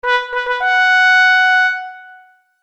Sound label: Fifth Fanfare